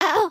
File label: need to pee again